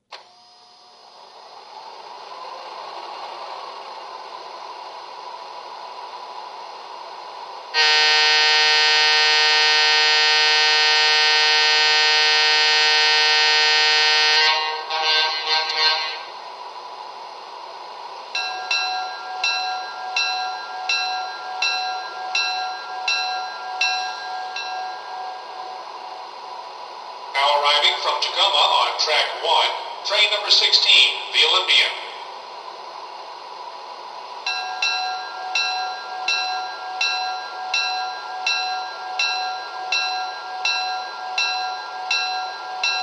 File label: bipolar, trains